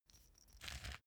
small pepper grinder glass ringing; industrie-wirtschaft; gastronomie

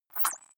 click domino effect, signale

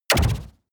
sci-fi impact short flutter

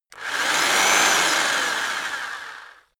rock drill rev reverse long; maschinen; bohrer

